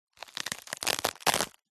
velcro rip first aid kit pouch; foley; zapsplat

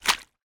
footstep water ice puddle deep; foley

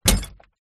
impact glass shards small hit with heavy object

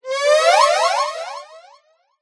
cartoon ascend with delay; zapsplat

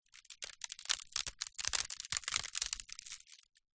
foley basket wicker crush snap stress light

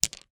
stones pebbles few place down; foley; zapsplat

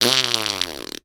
human mouth fart

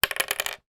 office felt tip pen lid drop on table

